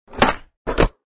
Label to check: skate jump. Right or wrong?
Right